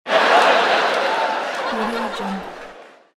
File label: audience laughing